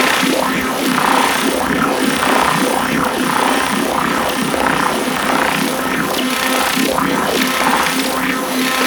Miter Alt Shot Loop, Weapons; Warframe; Tenno

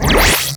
Laser Rifle Stop; Warframe; Crp Laser Rifle

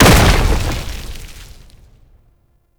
Containment Protocol Impact; Warframe; Qorvex

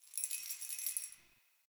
Keys Jingle; Warframe; Ambience; Nineteen Ninety Nine

